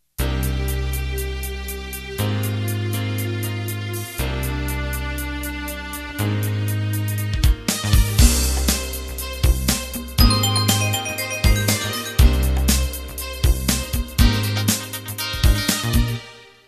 Fusion Intro

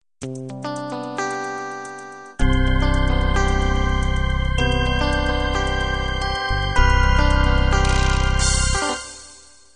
windy beat intro

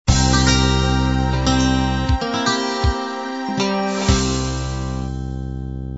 uk rb end

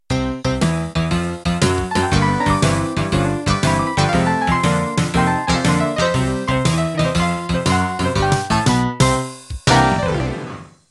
Rockabilly End

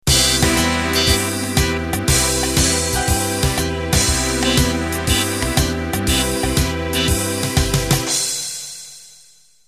Fox Trot Intro

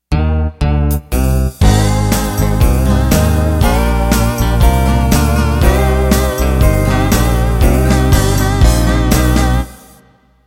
Modern Country Intro

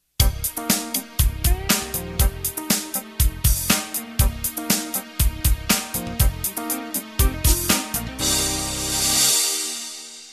Fusion End

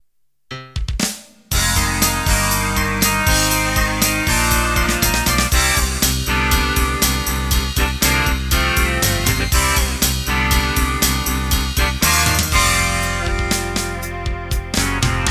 Heavy Rock Intro

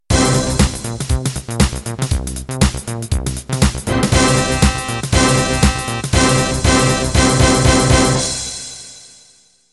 House Garage Intro

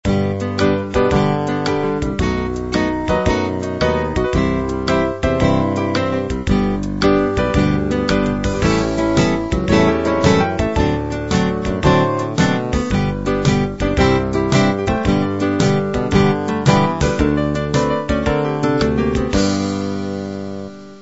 country blues

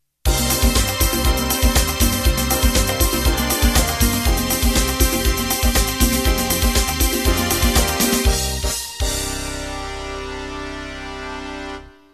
Disco Jazz End

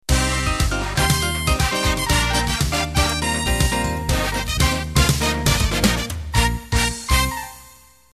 Samba Ballroom End

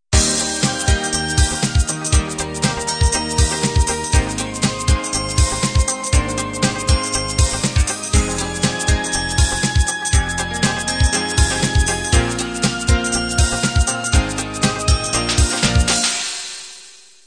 trendy beat intro

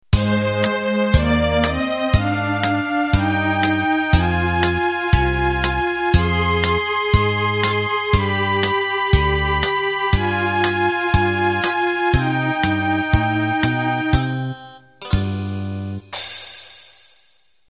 Broadway Intro

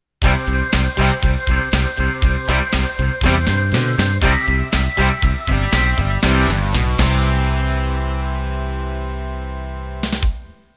Open Rock 8 End

